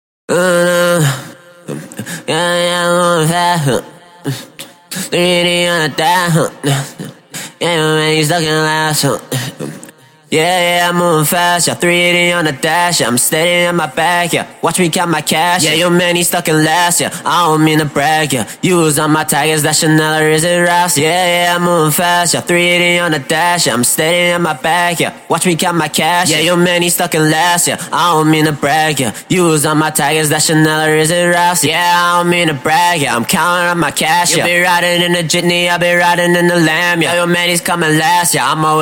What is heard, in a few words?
Fast hip hop 180bpm key a sharp, Acapellas